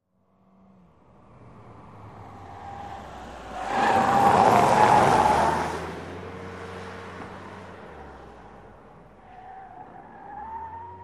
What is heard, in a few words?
Car Cornering, compact